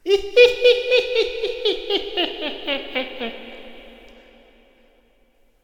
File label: crude laughter; monster